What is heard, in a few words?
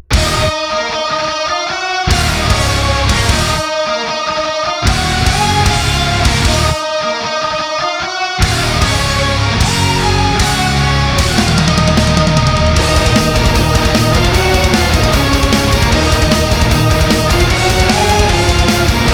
Instrumental